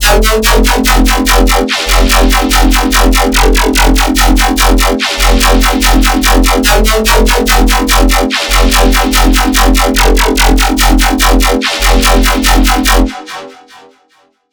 disperser riddim, bass

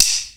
STICK, DRUMS; Crypton - DrumBase5000; CD DRUMS #1

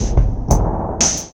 Gothic Bumpers, Fx; Sonic Foundry (Sony Creative Software); Esoterik Beatz

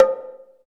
PER, Percussion

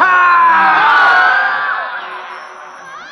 yell; Sonic Foundry (Sony Creative Software); Ugly Remnants VOL-2; Beatstuff

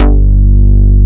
bass loop; Best Service Gigapack 2

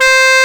BRASS C4; AKAI S6000 CD-ROM - Volume 1; BrassWind

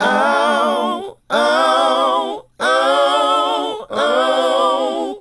Male Group, Vocal

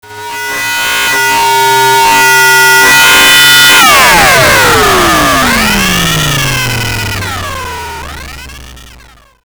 Robot; Sonic Foundry (Sony Creative Software); Underground UK House & Electro

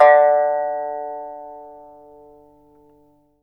PIPA D4; Spectrasonics; Heart of Asia

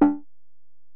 EPerc, Alesis DM-PRO; 300 Drum Machines

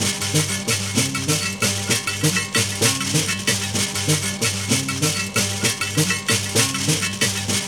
HARP SHAKE, HARP+SHAKER; Zero G - Ethnic

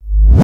BOOM, BASSDRUM, Techno 138 BPM; Best Service ProSamples vol.54; AKAI

